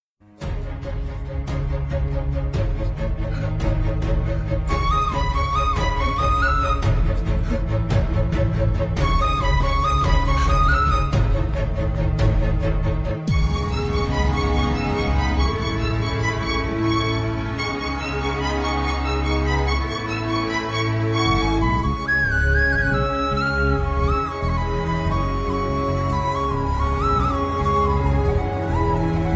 love failure bgm; siva karthikeyan

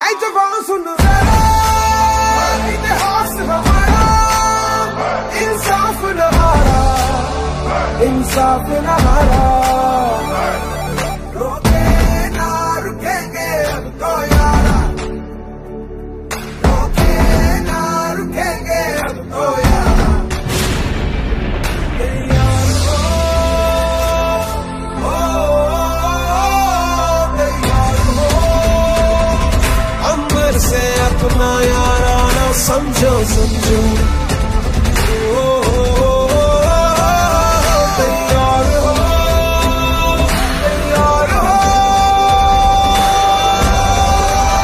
anthem ringtone; marvel

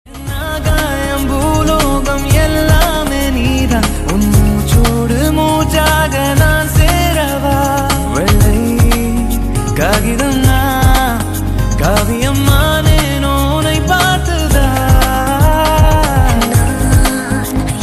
male, tamil ringtones; kavalai vendam

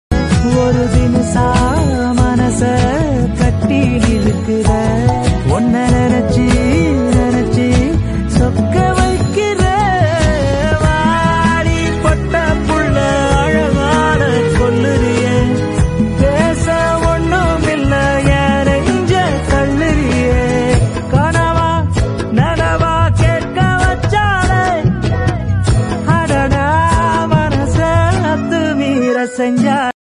love ringtone

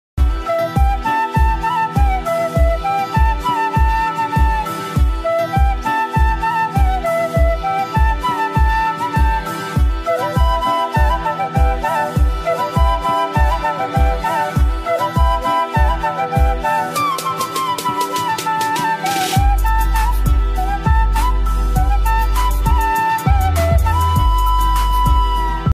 love bgm tamil ringtone

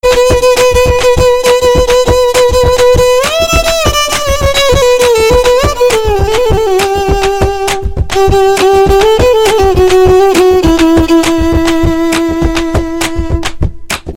bgm; manam kothi paravai

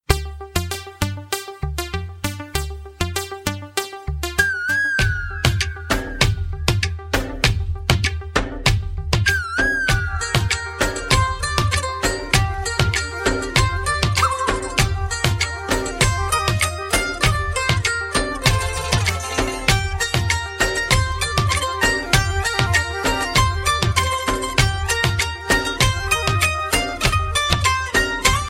intrumentel ringtone; telugu; aarya

